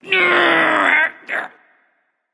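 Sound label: grunt lift, female; smite; voicepack; hera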